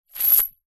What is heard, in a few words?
industrial chain small drop ground